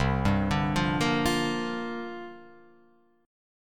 C 9th Suspended 4th, Guitar, Open F; arpeggiated; C9sus4; 0,2,2,0,1,0

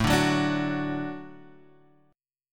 A 6th Suspended 4th, chord; A6sus4 Chord {5 5 4 7 7 X} strummed; 6sus4:1,4,(5),6

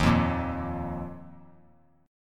Eb Suspended 2nd Eb down; Ebsus2 strummed; Ebsus2 chord; JGuitar

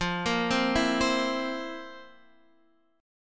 F Major 7th Suspended 4th; arpeggiated

